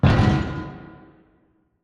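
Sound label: Assembly Conveyor Stop Distant; Boomtown; Titanfall 2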